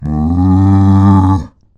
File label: cow sound